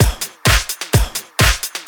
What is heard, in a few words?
Straight Loop 128 BPM, House